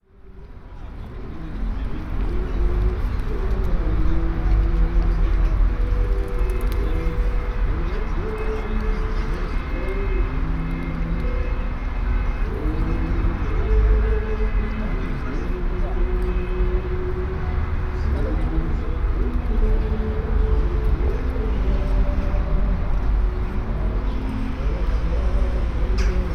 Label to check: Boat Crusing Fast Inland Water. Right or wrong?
Wrong